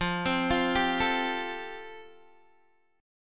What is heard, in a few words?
F Major Add 9th, Guitar DADGAD